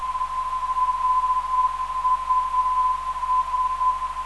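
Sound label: 415kHz, non-directional beacon; RUS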